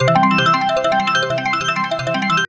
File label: collect; Grand Fruits